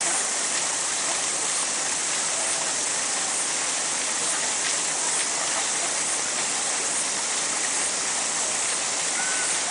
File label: Passer domesticus bathing in water, Anas platyrhynchos in background